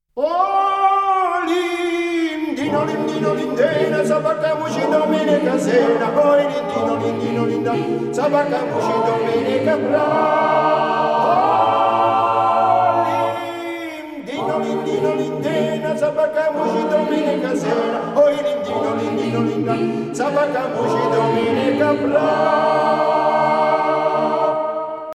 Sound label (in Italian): Coro; Coro Del Lunedi